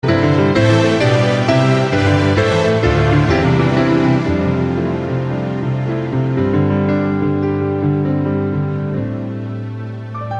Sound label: instrumental